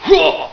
hyuuah; Isaac Asimov's The Ultimate Robot (1994)(Microsoft Home)

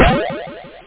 ECHO GUN; colours

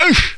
warrior; mordor

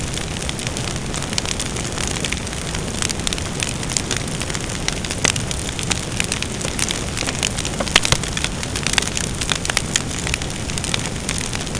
fire sound; PLANET X

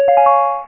Search done; FirstClass

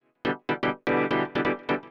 SYNTH HOUSE 125 A; eJay; Keys

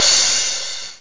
cymbal; BAS PERC; eXtended Instrument

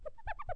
clean ball

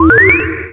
update; netshell

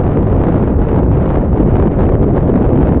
Engine; s1kdemo

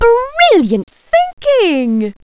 Laser Lab Sound

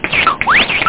hand link

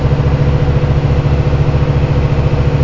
MOTOR, Slot Car

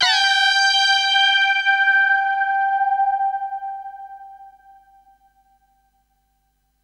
Techno, Melody, High B4; TechnoMusicMaker

